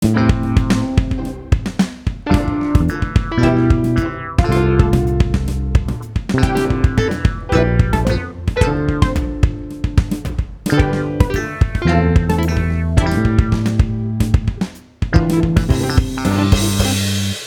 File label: GarageBand Midi; 學生作品